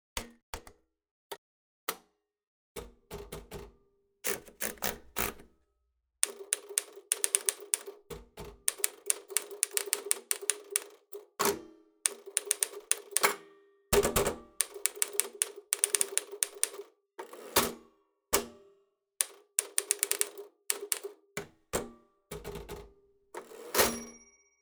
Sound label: remington typing